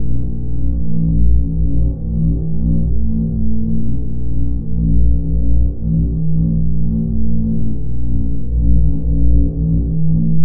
ambience

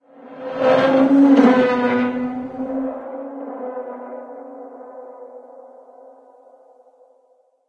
metal creak

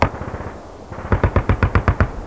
city battle; hl2

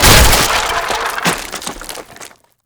wood break big

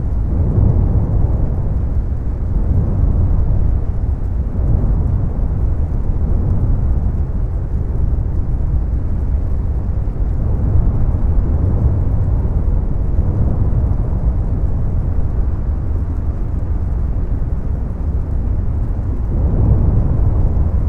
ambience disaster rumble

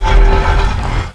open

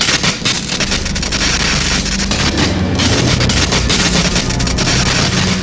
electric

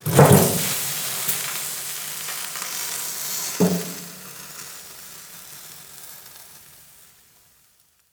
hiss

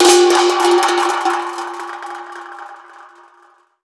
metal clang

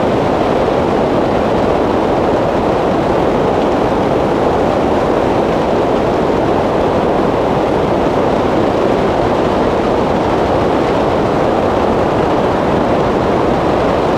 ambience wind rush

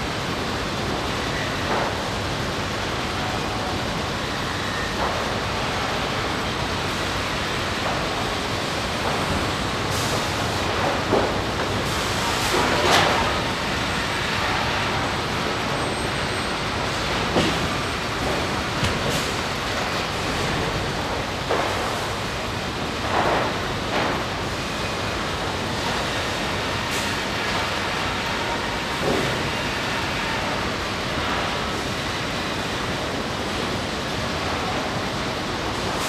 ambience industrial

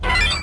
ring beep

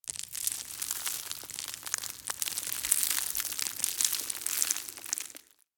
small debris

